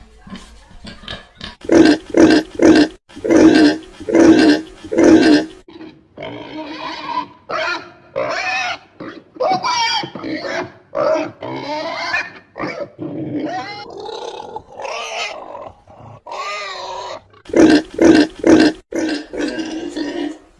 SWINIA

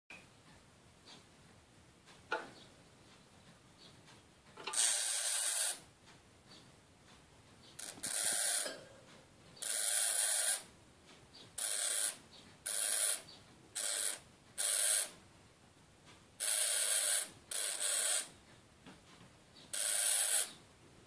Chomik zdenerwowany; Chomik Heniek Wielka Wyprawa

